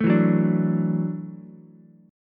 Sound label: E 7th Suspended 2nd Suspended 4th, down, Ukulele; E7sus2sus4 strummed; JGuitar